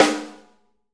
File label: Room Snare, Room Drums; Akai MPC500